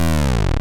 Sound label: fail; coolmathgames; 60 second santa run